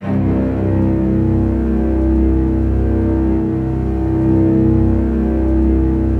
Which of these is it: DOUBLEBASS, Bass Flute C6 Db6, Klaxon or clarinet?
DOUBLEBASS